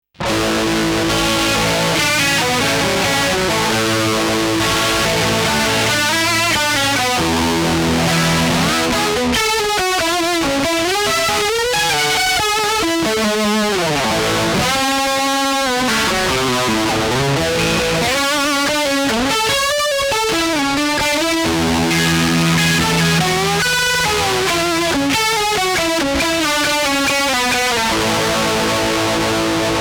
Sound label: Maxon CS9 Pro For Leads Effect